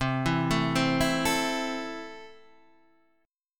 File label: E Suspended 4th Sharp 5th C